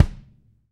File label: BD, Roland; Sound & Vision - Gigapack I